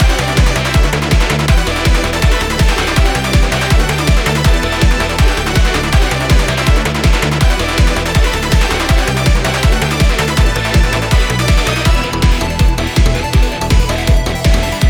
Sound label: boss loop; blade